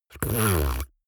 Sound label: beatbox tongue roll hard